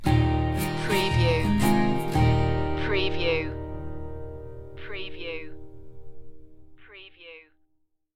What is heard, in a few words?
stinger